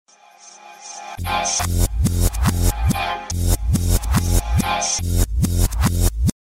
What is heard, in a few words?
dance sms reverse